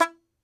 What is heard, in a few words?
Tenor Banjo, Plucked Strings